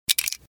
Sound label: sound jetton